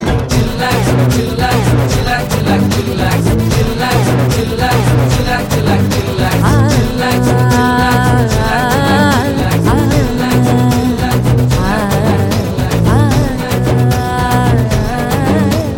chillax

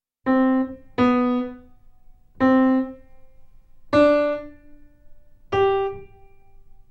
noob piano

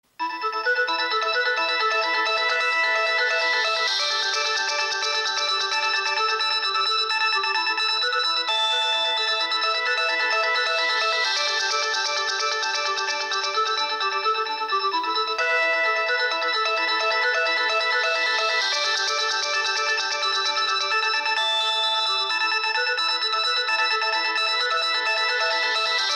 Pattern NOKIA6020

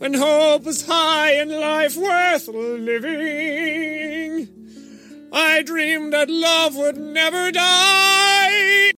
Bat Song

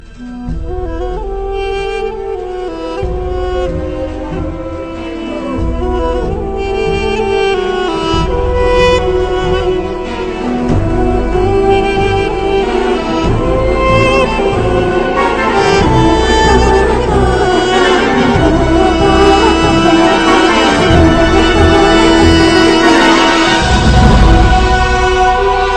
flute; alternative

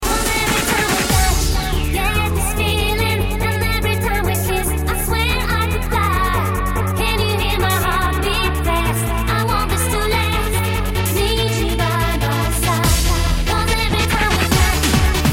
trance rave

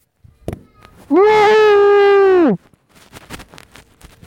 aaaaaaaaaaah, ringtones

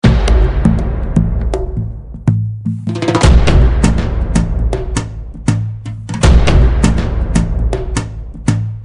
background; khaleja

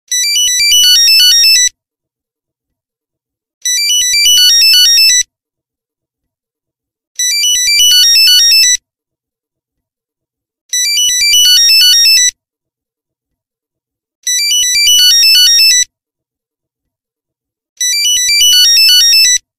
Retro robots; Nokia

